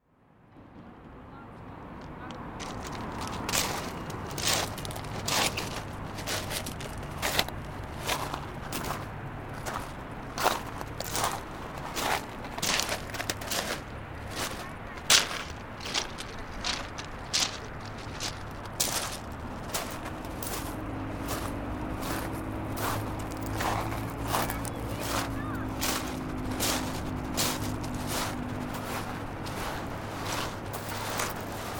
South Coast Soundscape

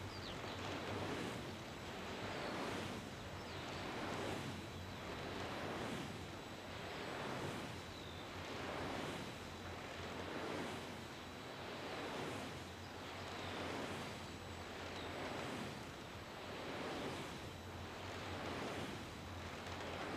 Blades

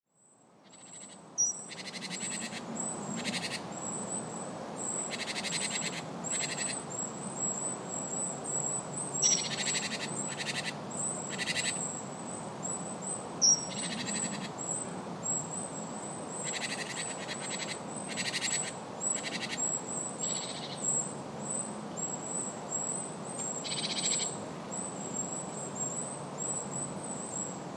August Forest Birds

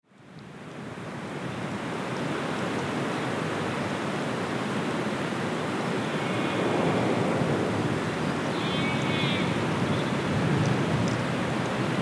Mountain Wind

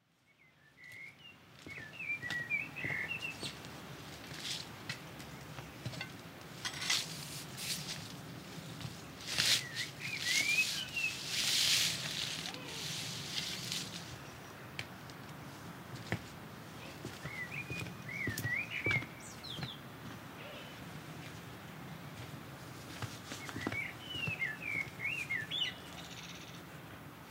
Spring Garden Soundscape

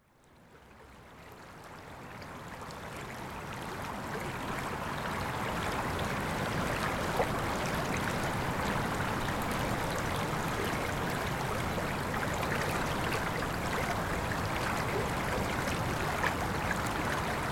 Gorseinon Winter Walk Soundscape

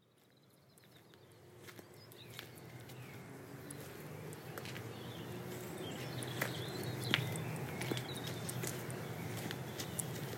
Marsh Evening Soundscape

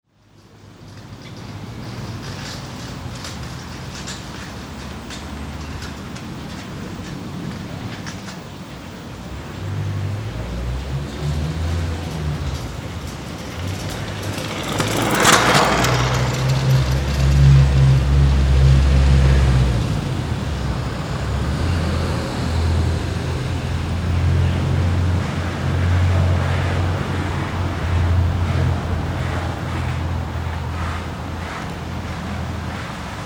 Scooters and Skateboards

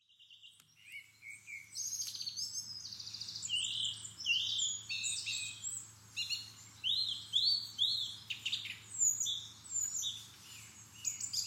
Songthrush in Coedbach Woods

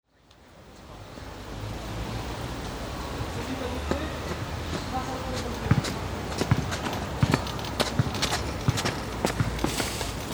Bouncing balls and babies

